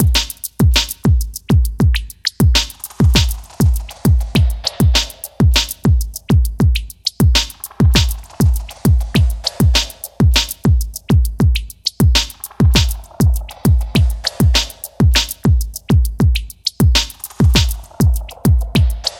loop 100bpm

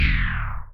Laser

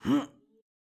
Voc Male Jump

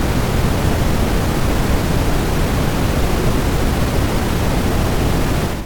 Static

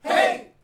Hey Men Shouting Together Jewish Wedding

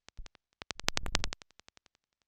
Click o rhythm

